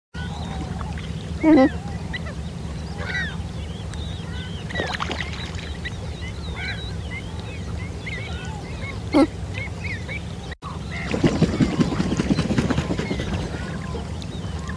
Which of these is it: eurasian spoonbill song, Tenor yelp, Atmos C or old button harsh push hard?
eurasian spoonbill song